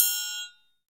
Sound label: triangle, percussion